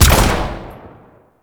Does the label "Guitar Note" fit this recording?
No